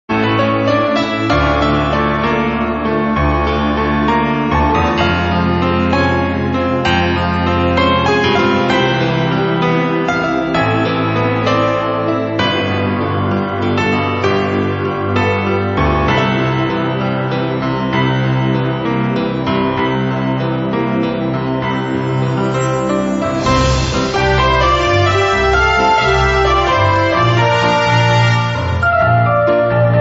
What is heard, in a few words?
piano version; Wizball